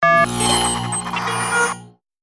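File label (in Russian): игровой эффекты высокий звонкий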